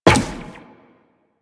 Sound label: Run Zipline, Footsteps; DE; Warframe; Tenno